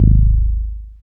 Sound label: BAS FM DX-7